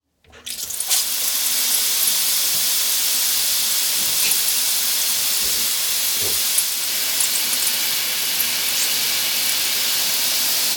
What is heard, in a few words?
Shower; london music mixing